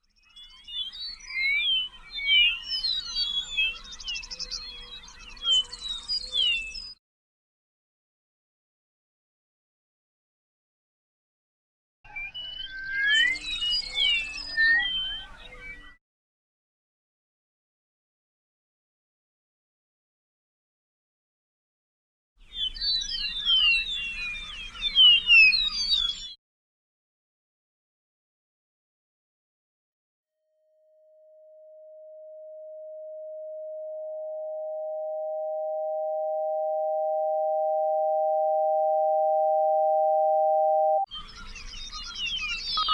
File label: cuckoo; cuckoo radio